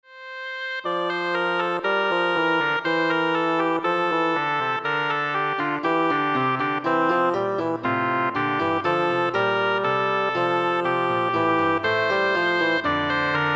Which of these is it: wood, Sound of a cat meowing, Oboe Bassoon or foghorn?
Oboe Bassoon